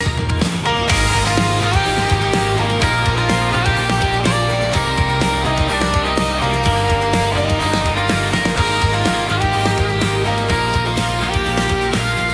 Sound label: loop; opening